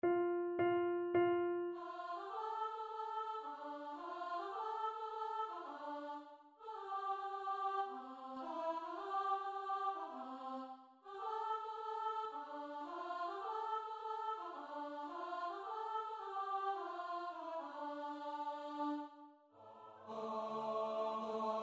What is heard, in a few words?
TENOR STUDY